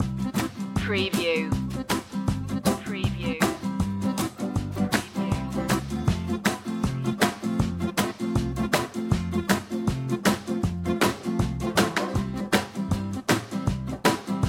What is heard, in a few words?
zydeco loop